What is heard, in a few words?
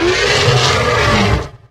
boss alert; dn3d r; cycloid emperor